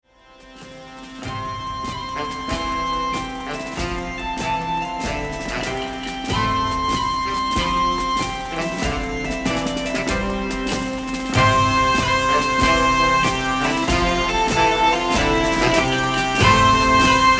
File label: The Scottish Bagpipers Infiltration